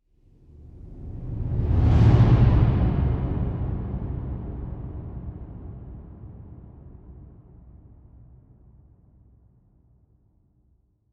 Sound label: Low Swoosh